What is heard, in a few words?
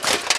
foley, footsteps; physics; axe